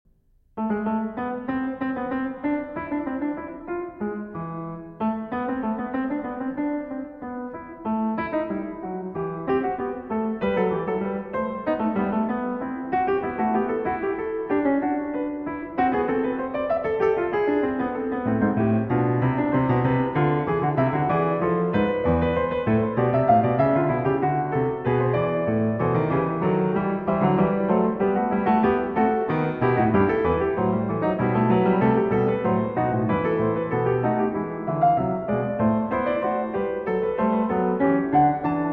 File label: Fugue in A Minor; Fugue No. 20; From the Well-Tempered Clavier Book I, BWV 865